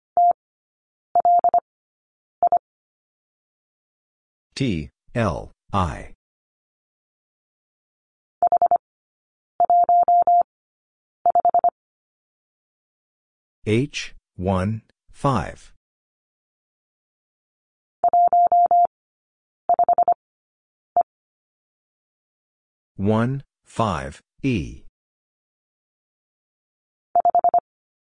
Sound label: Number 5 Three Characters 25wpm, morsecode; Lesson 15e